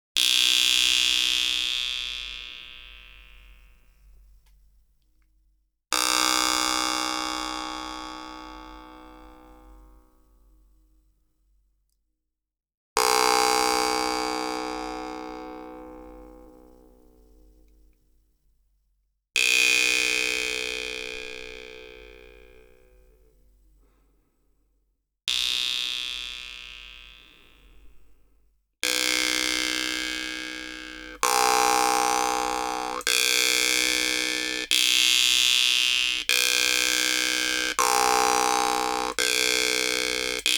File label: sound, jews harp